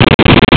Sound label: ELECTRIC